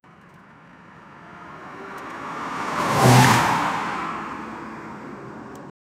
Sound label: sound of car drifting and, crash